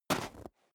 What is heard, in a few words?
step snow single right